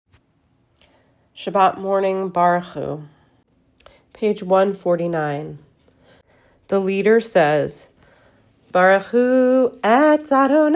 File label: shabbat morning barchu